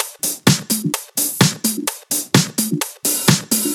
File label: Minimal Loops